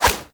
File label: Punch Whoosh; Warframe; Weapons; Infested; Inf Kick Punch